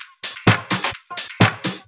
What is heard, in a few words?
Electro Loop 128 BPM; Vengeance Samples